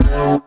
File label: D STAB, Funky Underground